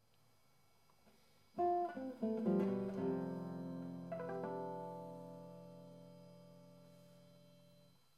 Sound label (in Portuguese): Harmónicos em cordas contíguas